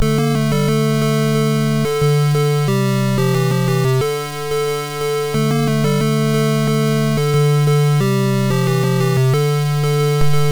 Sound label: africa music; 8 eyes